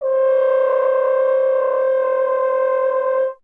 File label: F. Horn; Miroslav Vitous; Solo Instruments VOL-2